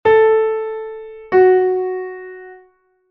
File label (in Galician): 3ª menor LA- FA#